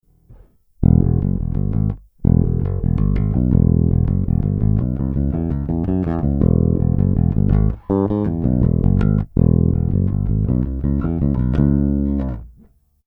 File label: Cort B4 plus AS Neck PU